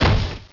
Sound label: Thunder Punch; battle anims; pokerogue